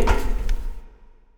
foot metal; Earth and Beyond; EA Games

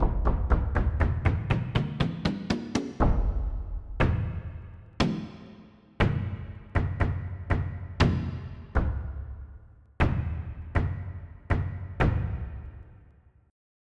Taiko

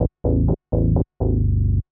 SNTH BASS HOUSE 125 A; eJay

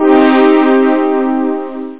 MS 6 Minor

